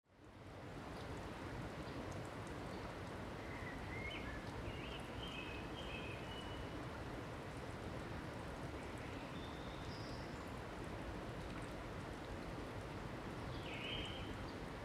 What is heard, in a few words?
Soundscape; mYcard